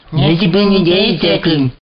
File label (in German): gemurmel